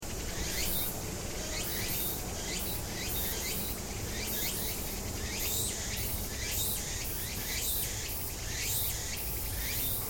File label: Swamp Cicadas And Frogs, Hot and wet sounding marsh or swamp ambience; Hot and wet sounding marsh or swamp ambience, dominated by cicadas and constant buzz of insects; Cicada Sound Effects; Lynne Music